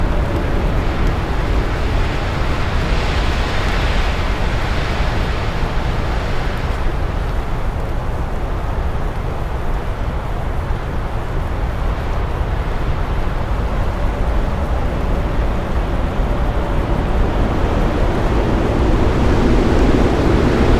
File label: wind